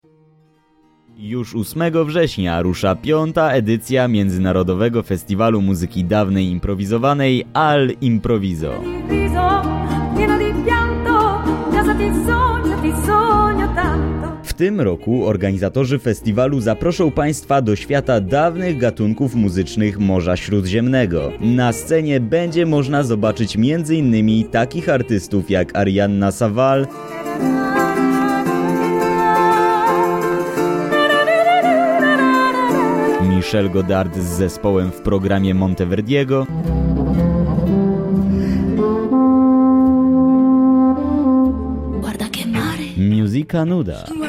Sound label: all impro